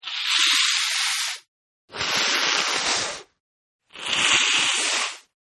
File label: Nose Blow, Man Blowing His Nose On Tissue Paper-3 Takes, Wet Sounding; Sick; Human; Sick Sound Effects